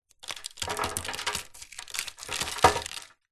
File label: crumbs cookie sprinkle metal baking tray; food